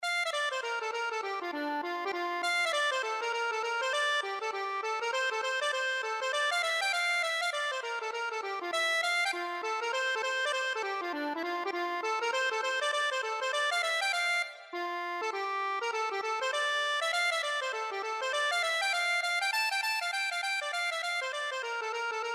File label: accordion